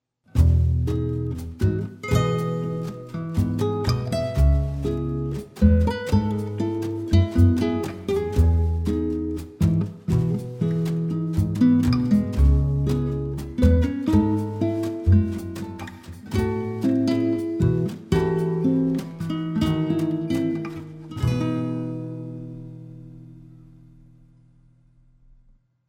bossa jingle

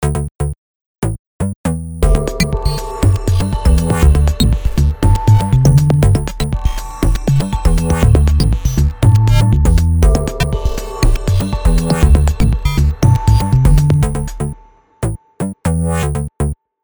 bottling machine jingle

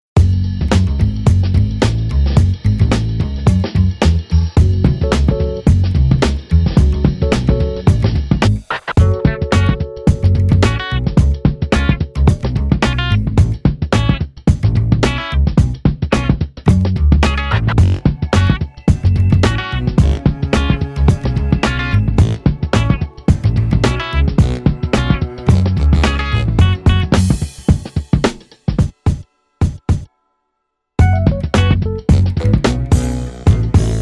g groove

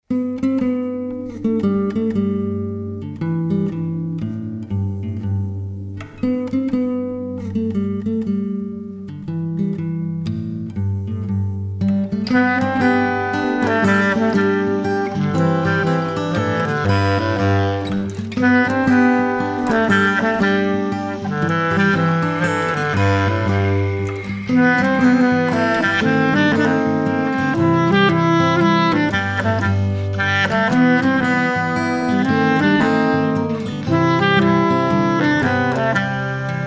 fall wind; emouvant

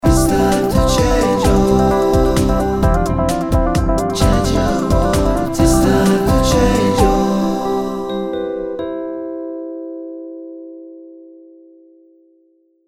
change your world jingle

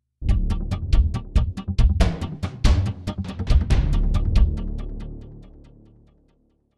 dark jingle

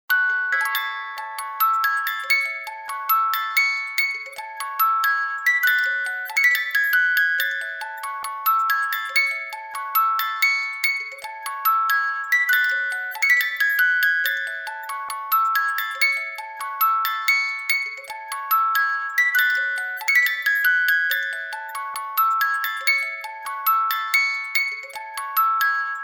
music box

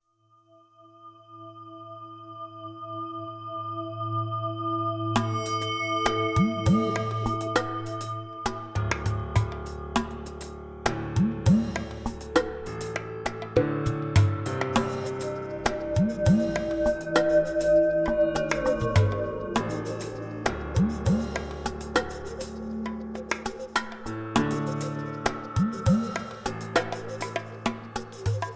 beat; quete

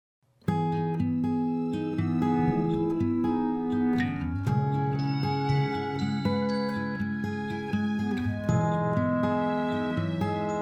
quiet jingle

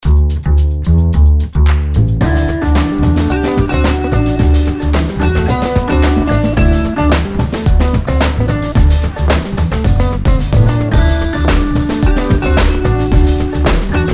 drum and double bass jingle

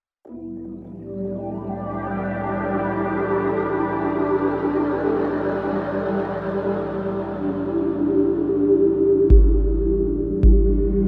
icy whale, baleine

